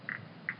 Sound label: rhacophorus reinwardtii, frogs